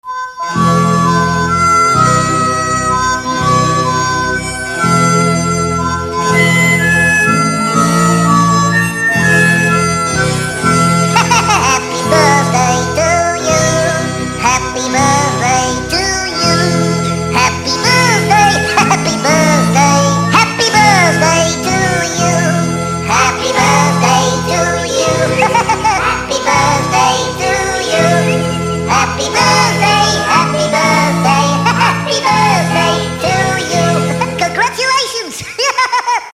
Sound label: Happy birthday МУЛЬТ